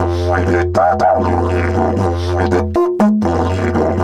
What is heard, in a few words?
120, Didgeridoo; Didgeridoo and Other Primitive Instruments; 120BPM